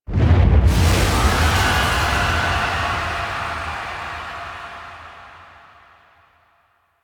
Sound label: Explosion And Alarm; The War Within; Bone Pit